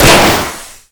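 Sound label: vehicle tire explosion blowout; Explosions; Call of Duty Modern Warfare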